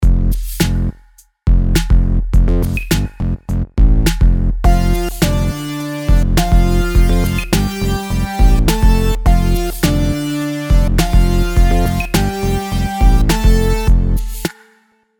melodies simple 4s Fmaj; melody

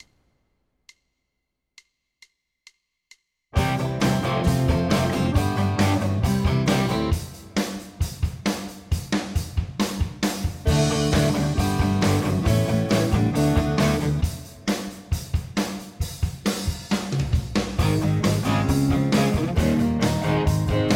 finding tonic major rock; harmony

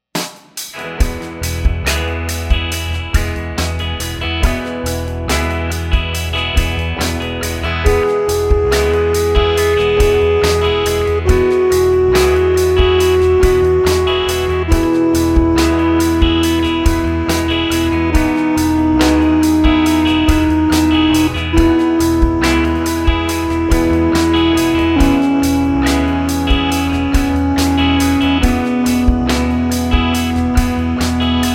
scale degree

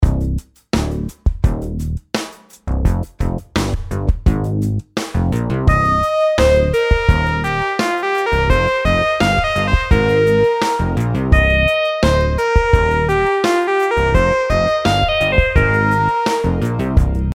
rhythm simple 8s triplets

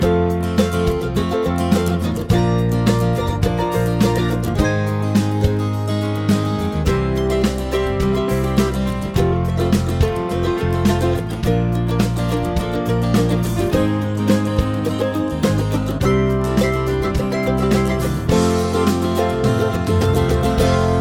chord prog with mixture

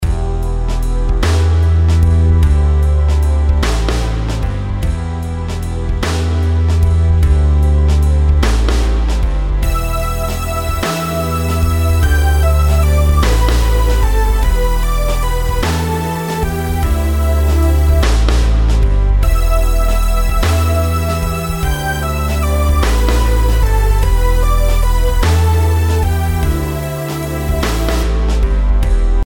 rhythms compound 8s

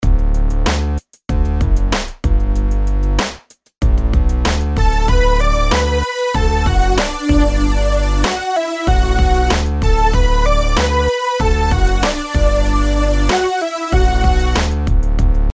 rhythms simple 8s major

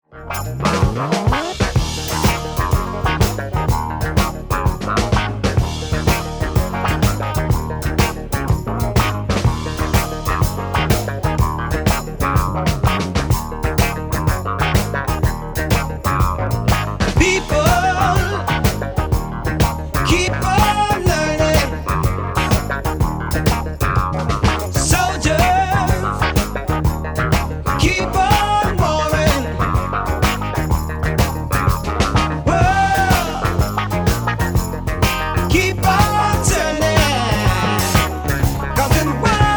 4-4 shuffle; rhythm; meter id

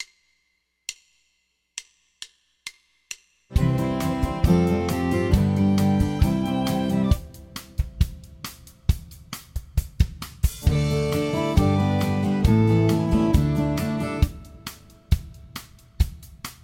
finding tonic major country; harmony

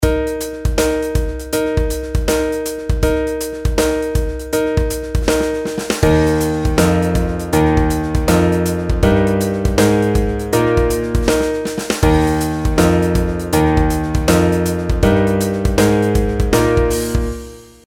pitch patterns stepwise

